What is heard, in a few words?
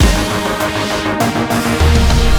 Burning-Hot Beats